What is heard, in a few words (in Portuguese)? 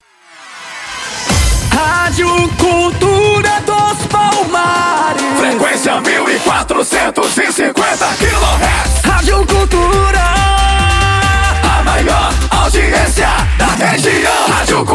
vinheta frequência 1450 khz; radio cultura dos palmares; kzam studio